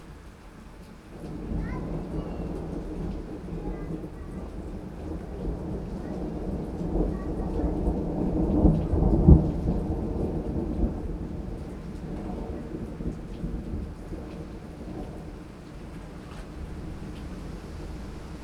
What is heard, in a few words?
field thunder spring; soundscapes